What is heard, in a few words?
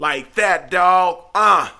Rap Vox